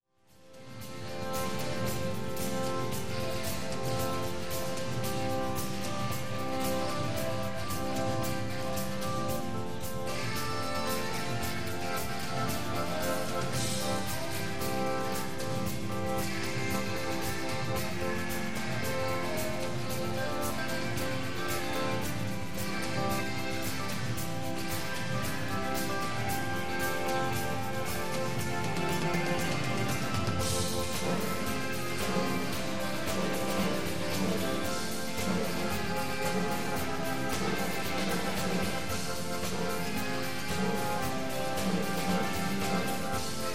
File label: stereo excerpt instrumental live